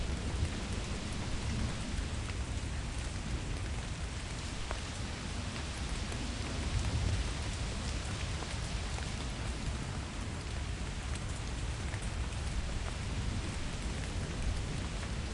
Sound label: Rain